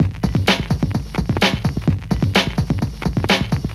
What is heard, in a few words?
House Beat, 128 BPM